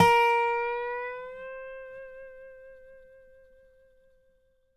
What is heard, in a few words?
DOBRO UP; C5